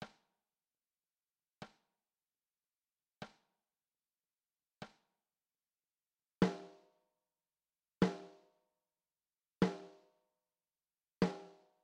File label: drums snare; brut